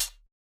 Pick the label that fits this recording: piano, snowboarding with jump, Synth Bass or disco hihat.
disco hihat